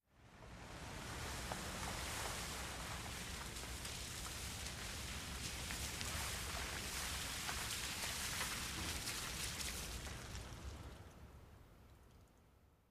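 Car By close up On Wet Pavement Medium, Slow Speed, Engine Off.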